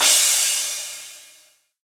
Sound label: EDM Future Crash, Cymbals